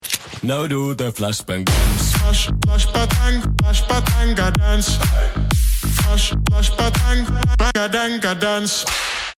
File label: Flashback Dance; cstrike; mvp